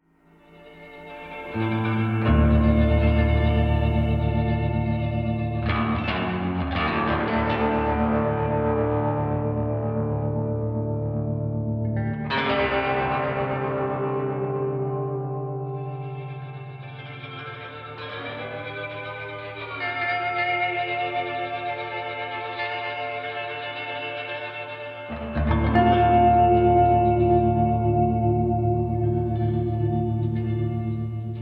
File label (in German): atmosphaerisch